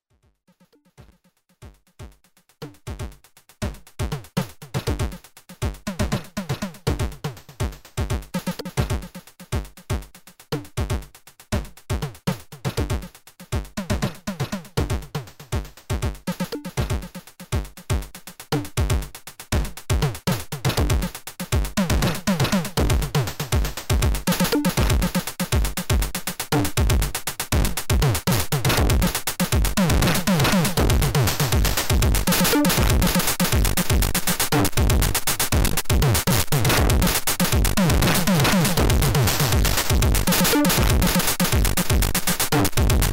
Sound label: Static Machine Filter Dist vs LoFi Dist